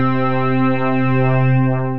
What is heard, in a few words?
Pewter Keys; Wavetable; Ableton; Synth Essentials